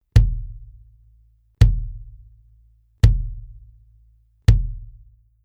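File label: BASS Clr Bury Beater, bass drum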